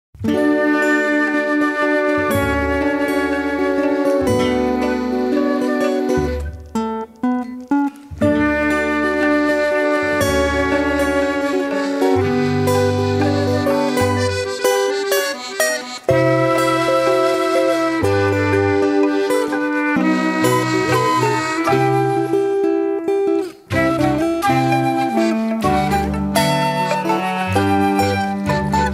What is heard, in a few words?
Jazz